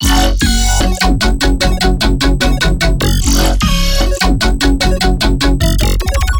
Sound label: Melodic Bass Loop F# 150 - Chiptune; Loops; Ghosthack - Color Bass Essentials